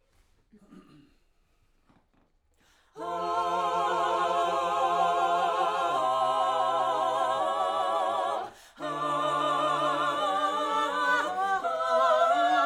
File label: Backs; SCHOEPS